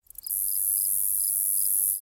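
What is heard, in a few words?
Pets Insect Large Idle; creatures; Lego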